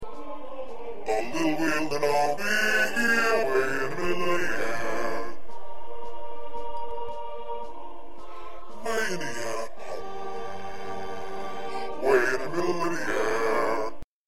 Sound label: 2nd Bass